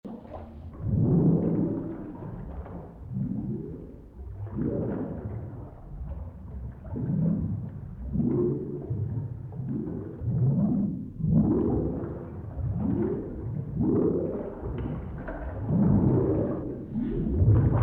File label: bouncy; the archive audium vi